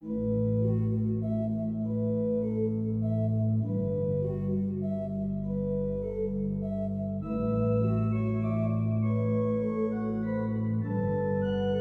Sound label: Organ Trio; aJHR Organ Book XII I Trio